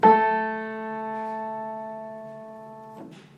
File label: Oakland Piano Co A3 A4 pure 4 2 just octave